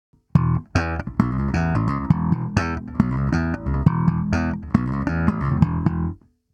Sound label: Session Slap